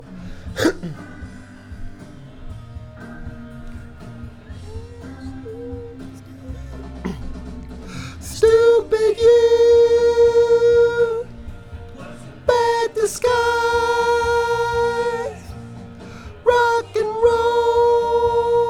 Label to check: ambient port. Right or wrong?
Wrong